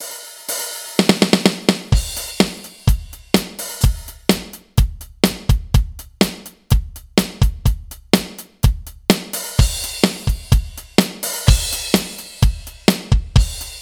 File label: Millenium MPS 850 Custom Pop Kit